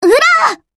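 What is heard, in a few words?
attack; hibiki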